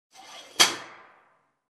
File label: Sliding Door lock click sound